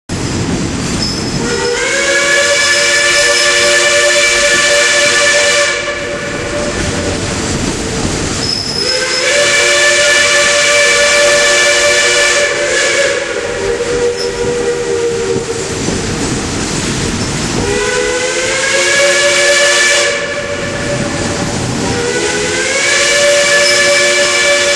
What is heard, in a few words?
Shay whistle